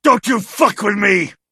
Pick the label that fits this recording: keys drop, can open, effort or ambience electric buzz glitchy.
effort